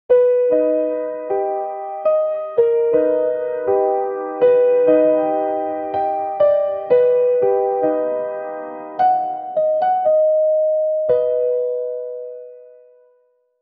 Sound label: depressed piano melody in